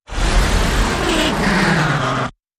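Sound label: Creature Grinding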